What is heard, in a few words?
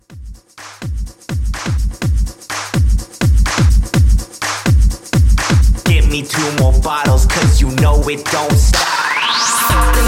Mashup Re Drum 125